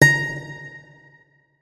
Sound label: notes, guitar; Hideout Furniture